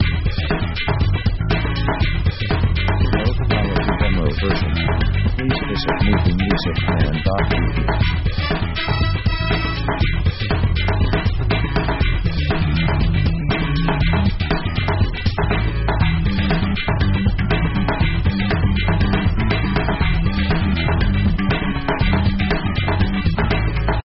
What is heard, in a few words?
funk hot sauce